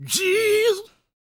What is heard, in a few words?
GOSP MALE, Vocal Planet Gospel